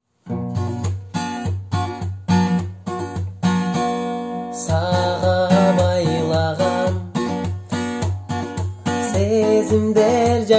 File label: gitara kaver